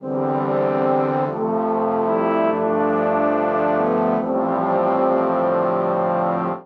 Progression Reconciliation I-IV-V-ii C Major 72 bpm, Brass Quartet; MIDI Clips; Ableton; Spitfire Audio